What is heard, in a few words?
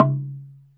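SLITDRM C#3 F, slit drum; SLIT DRM1; Heart of Africa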